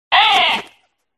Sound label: raven attack bottom